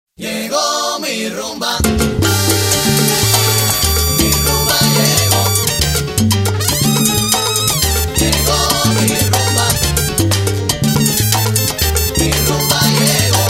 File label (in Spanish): Rumba